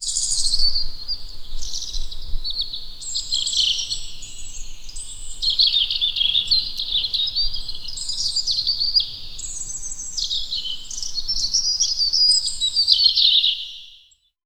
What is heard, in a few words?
the cry of a fantastic, bird cry